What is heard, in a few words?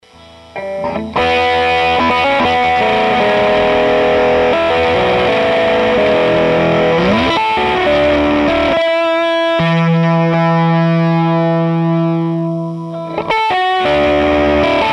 p416b No Wood, fuzz; Fuzz Tone List